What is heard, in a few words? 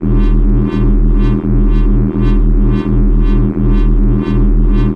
UFO; disasters